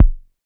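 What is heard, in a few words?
technox bass drum; Quasimidi Technox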